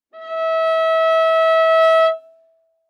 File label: Viola arco ff sul G E5; MIS Pitches - 2014; Strings